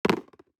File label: DICE on Cardboard, Throw and Roll, Metal, Two Dice; Samples; ArticulatedSounds; DICE Sfx Party (Extended)